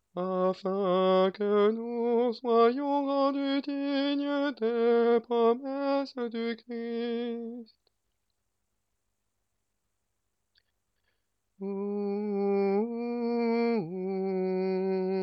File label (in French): tenor; Angelus oraison; mariage